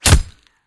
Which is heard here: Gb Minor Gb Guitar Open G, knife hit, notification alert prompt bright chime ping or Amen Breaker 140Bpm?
knife hit